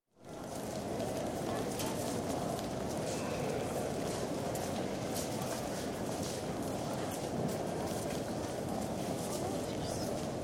Main Line Railway Station, with footsteps, voices, trolleys, destination indicators & PA announcements; PA announcements (at 2m45s); Train; BBC